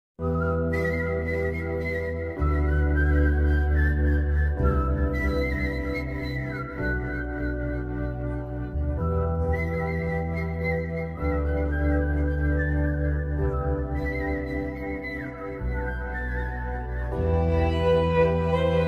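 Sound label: entry background music ringtone trending background music ringtone; animal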